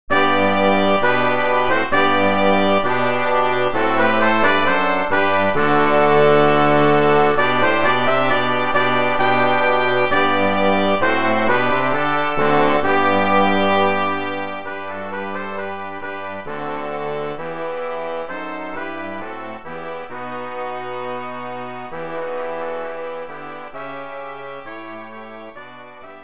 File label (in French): Choeur, cuivres; Haendel GF; extrait de lOratorio Judas Maccabaeus